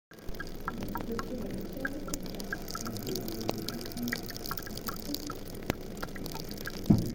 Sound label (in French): glouglou